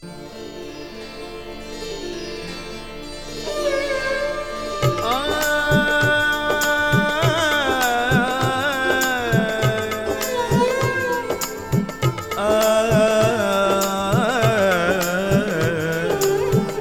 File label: Alap